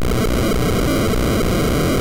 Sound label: noise